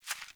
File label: Hare Footstep, Foley; Animals; Ambient